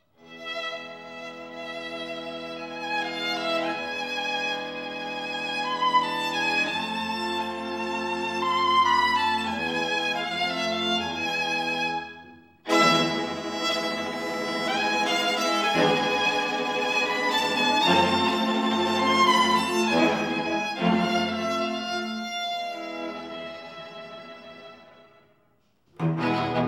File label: String Quartet, Hava Nagila; Winnipeg Canada